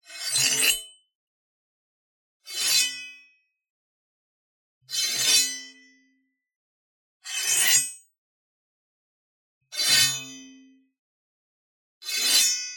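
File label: Sword Weapon Slow Scrapes, Melee Weapons; Justsoundeffects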